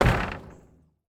bridge, stomp, average, arched, wood, metal, light iron, gully, stream, forest, strong; hum; Eiravaein Sound; Gravitas; rattle